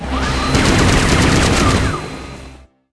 attack

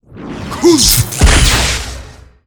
kentauros, monster

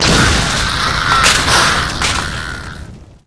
dark phalanx dead, monster

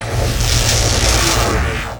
Electric Field main

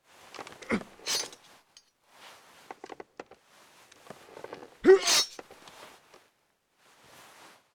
temwarrior idle, monster

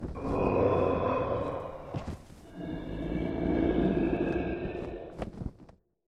lesser demon idle, monster

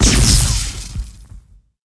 skill explo

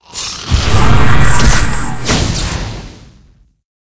felidae attack, monster

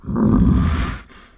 guardian sobek, monster

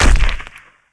evil noname machine attack impact

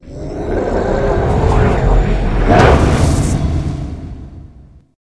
lich attack, monster; rigel

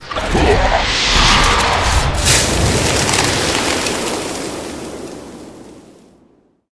beatle king death, monster

